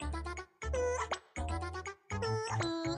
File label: ambience free spin; fruits